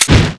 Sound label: use, flamer, weapons; vortex